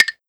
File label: Perc Stick